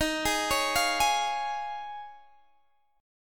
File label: D sharp 9th Suspended 4th D sharp, Guitar DADGAD